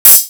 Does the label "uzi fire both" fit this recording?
No